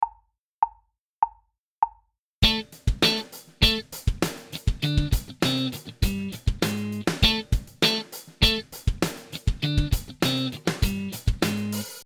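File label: Funk Accenting Octaves Am, Guitar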